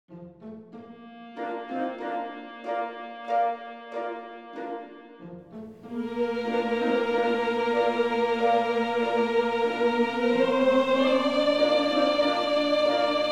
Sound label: Humming chorus with chorus; Madama Butterfly (Act 2)